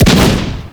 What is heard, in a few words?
rocket barrage target; weapons; dota; gyrocopter